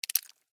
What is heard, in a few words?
Blood Spill Drip, Horror, Blood, Spill, Drip; Single, Short; Blood And Guts Sound Effects; Blast Wave